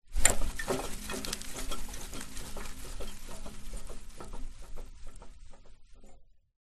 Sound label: public places playground spring rocker pull back release